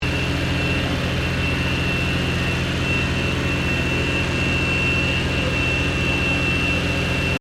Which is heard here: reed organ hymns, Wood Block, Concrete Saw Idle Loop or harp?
Concrete Saw Idle Loop